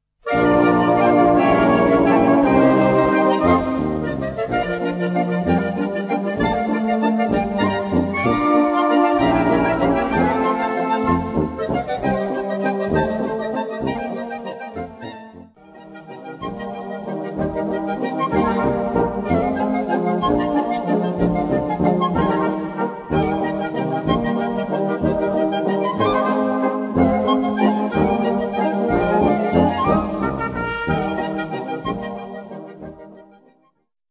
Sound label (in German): Klarinette